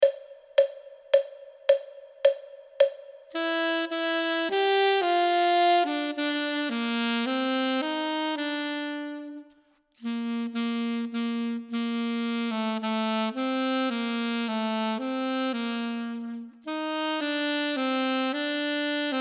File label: duet part 2; Ten Easy Duets